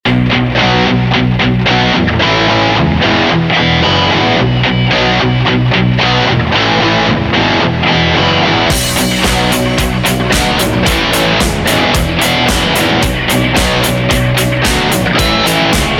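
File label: mosnyj proigrys, powerful loss, ringtone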